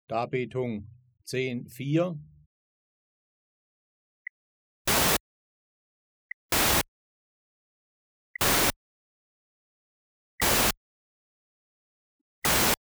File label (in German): Folgegedrosselte Lautheit; Die Folgedrosselung eines 5 m